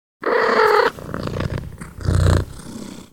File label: purr